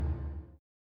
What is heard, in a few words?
Mus Tsunami 145 Light; From Dust; ps3; xbox 360